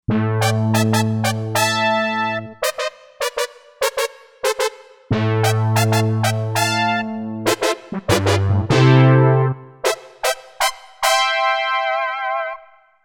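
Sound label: Brass JX Stabs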